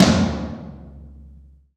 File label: TOM TOM, Roland